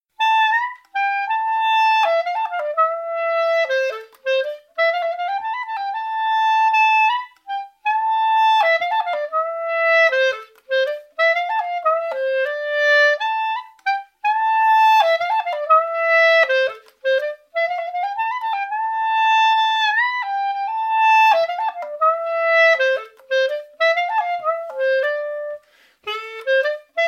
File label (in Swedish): klarinett polska; orsa; efter gossa anders andersson